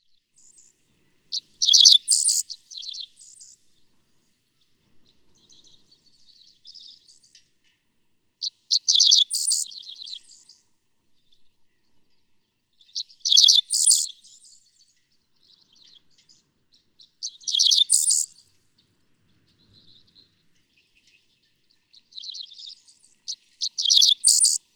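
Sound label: Dickcissel, Missouri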